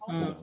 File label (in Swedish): Skrik